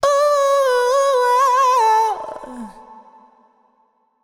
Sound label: Euphoria Ad Lib Wet - 100 BPM D Maj; Cymatics; Vocal Sample Pack; Ad Libs